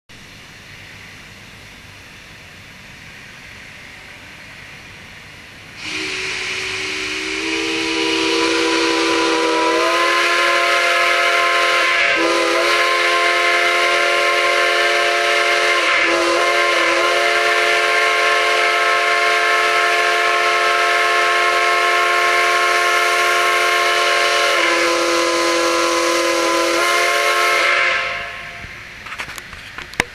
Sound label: Five chime Full Scale Replica